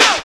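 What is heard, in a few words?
snare drum; E-Lab; X Static Goldmine 1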